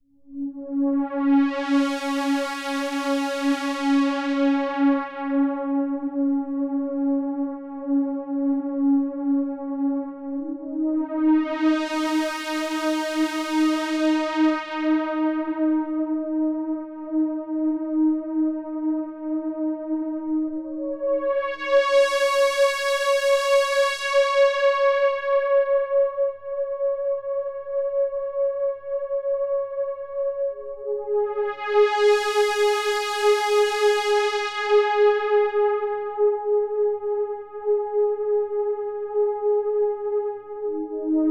big matrix pad

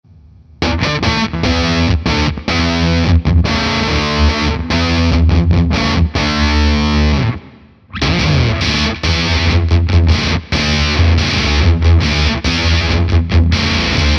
Orange Mode Voice; Stone Deaf

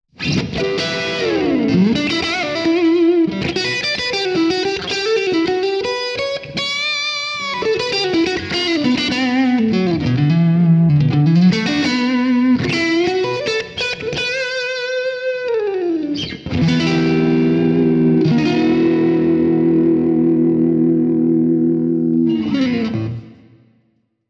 Mesa Boogie Glassy Single Coil; Neural DSP

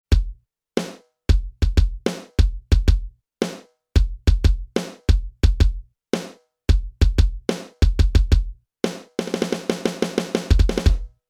Beat; Roland TM1

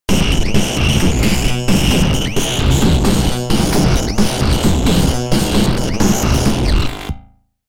Absynth Biospheric Loop

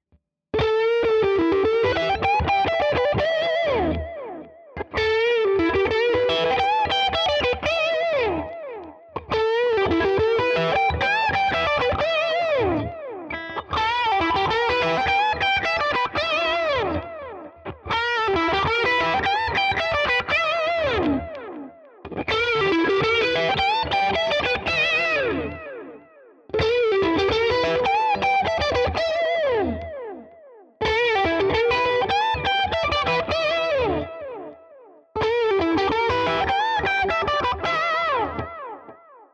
Ibanez AZ SDB mid gain Lick all positions

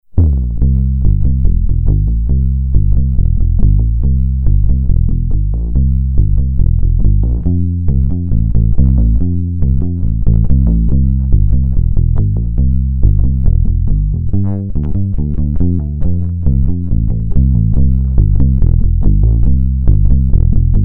Bass Blues Finger

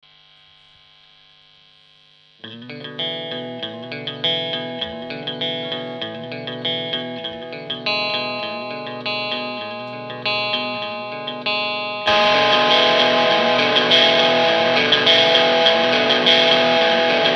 nexi overdrive amp

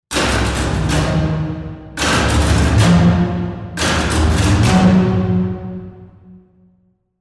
String Ensemble Bass Bartok Pizzicato

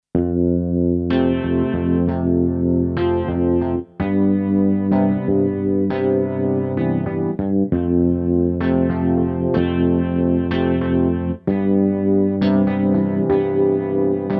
Charlie Lab Digitar

